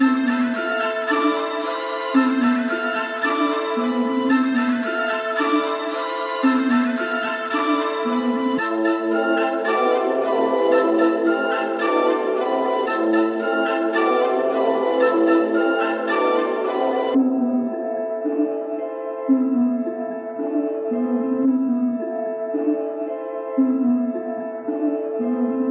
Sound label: 112 BPM A Min; Cymatics; 2022 Melody USB Expansion